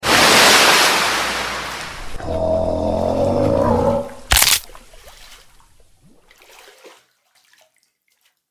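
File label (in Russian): резкое шипение волны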